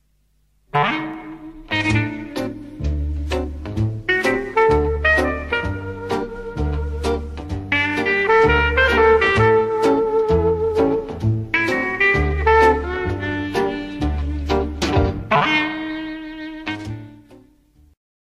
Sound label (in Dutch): Houtblazers klarinet in jazz